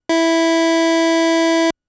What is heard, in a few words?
Pulse A E3; fleet keys Samples